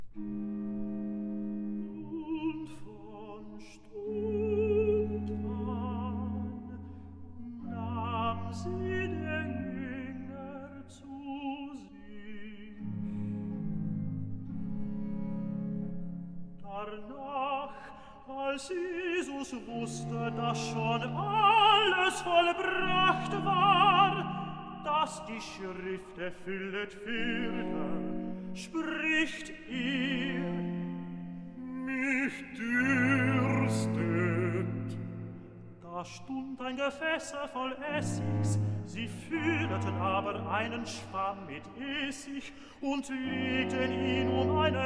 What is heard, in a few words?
Recitative; Und Von Stund An Nahm Sie Die Jünger; Klassika; Bach, Johann Sebastian; Choral Works; Johannes Passion BWV 245